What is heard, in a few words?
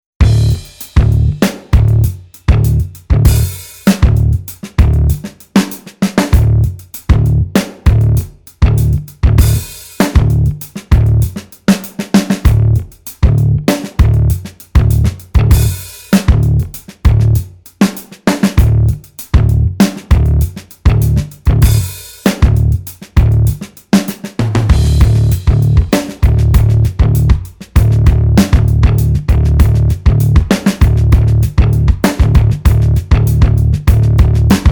soundtracks, 98 bpm